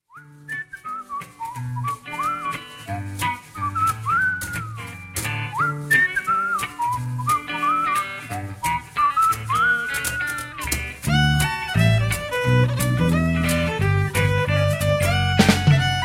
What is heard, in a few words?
english whistle